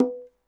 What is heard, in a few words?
bongo, latin hits n licks